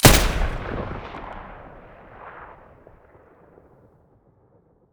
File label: muzzle m249 stereo, weapons